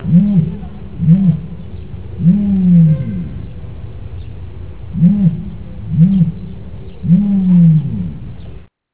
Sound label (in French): autruche